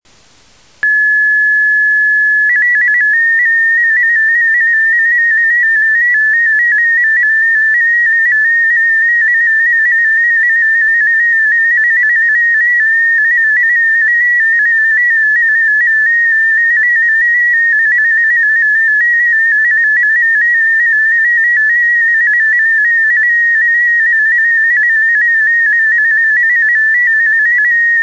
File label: Sound Sample MFSK 16